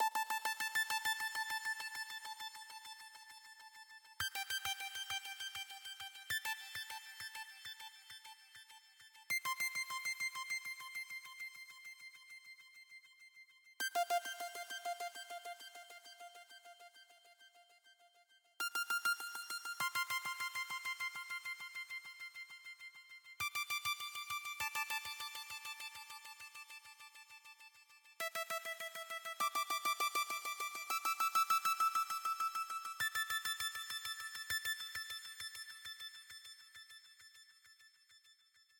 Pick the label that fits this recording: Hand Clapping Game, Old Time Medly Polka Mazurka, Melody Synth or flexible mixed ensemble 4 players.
Melody Synth